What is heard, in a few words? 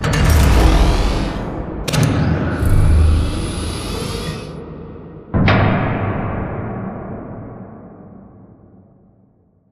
Giant Floor Panel Lower Rotate Mech; Boomtown; Sideways Town; Titanfall 2